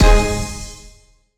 BGM Gameplay Goal; Everybody 1-2-Switch!

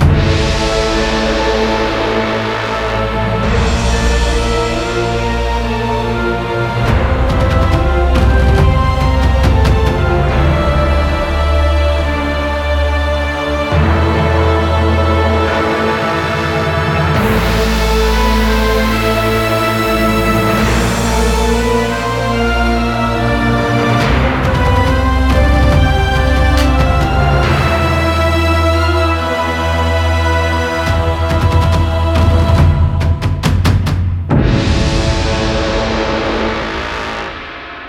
TENSION ENDING

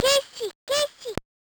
Erase; O-chan no Oekaki Logic; WonderSwan

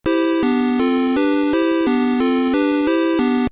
Next Period Start; Hit the Ice; GB

